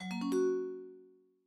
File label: call begin